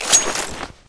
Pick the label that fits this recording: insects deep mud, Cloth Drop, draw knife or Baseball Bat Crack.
draw knife